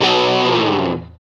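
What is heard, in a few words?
GTR CRUNCH, Metal; Power Chds; Club-50 - Foundations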